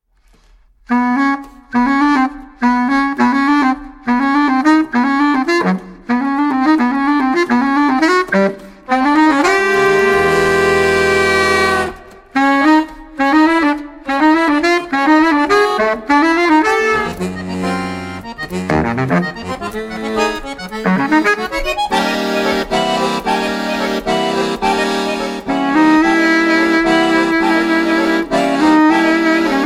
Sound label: sax akkord, accordion